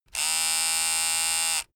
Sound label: razor short